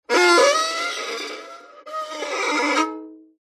violin badly played screeching bowed

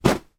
household pillow drop on floor; sound effects one

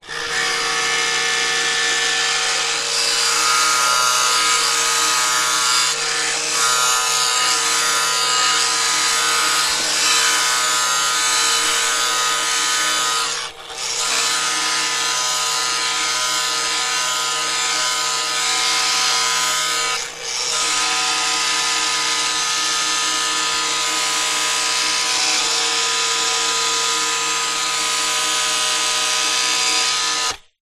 industrial power tool grinder on wood buzz

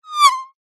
cartoon mosquito fly by; zapsplat

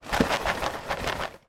food cereal frosted flakes box shake

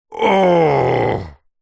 human male grumpy angry frustrated growling sigh

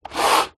technology computer mouse movement desk

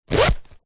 bag rucksack fabric empty zipper; foley; zapsplat

